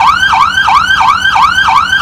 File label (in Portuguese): sirene